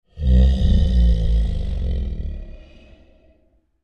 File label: Creature Large Breath Sigh Grumble; Scary Breathing; Big Room Sound